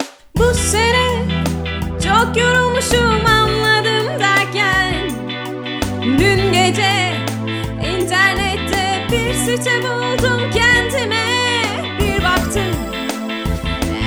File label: Jingle; Plaza Tur